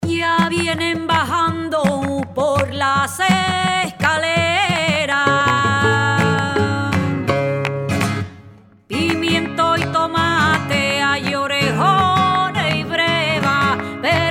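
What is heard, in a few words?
tangos, flamenco guitar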